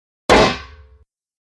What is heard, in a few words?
Armor Hits